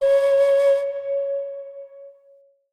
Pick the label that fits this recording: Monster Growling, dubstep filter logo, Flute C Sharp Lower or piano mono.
Flute C Sharp Lower